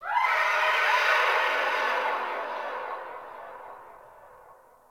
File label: Venus Far Distant Creatures; Warframe; Venus Open World; Venus Landscape; Ambience